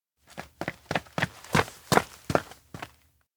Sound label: steps outdoor town jogup; anemoi